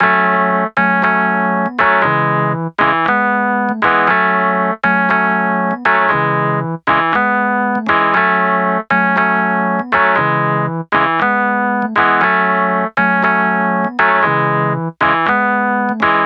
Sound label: House Pianos 118 BPM F m DRY; Ultra